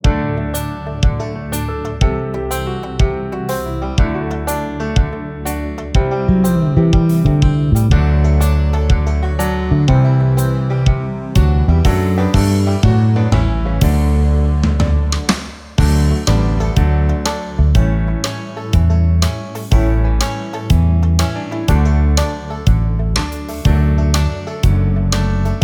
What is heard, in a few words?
Instrumental; Steps to Christ Activity book CD